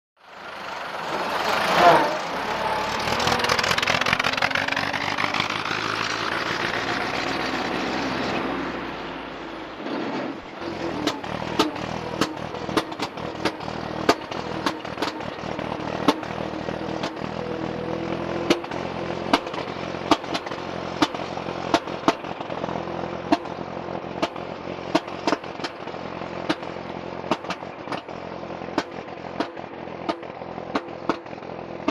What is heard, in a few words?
Stop Off Vintage Dennis